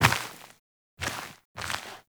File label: Steps; Umbra; Warframe; Sacrifice; Sacrifice Shrine Intro; Cinematics